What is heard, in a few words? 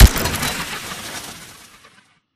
atk mech warble tail; Titanfall 2